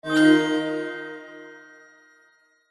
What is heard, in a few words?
game sound tone magical